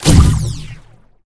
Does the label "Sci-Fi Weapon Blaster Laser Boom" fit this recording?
Yes